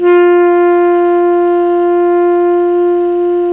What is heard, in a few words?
TENOR PP F 4, Saxophones; Greytsounds; Philip Wolfe David Crozier's Saxophones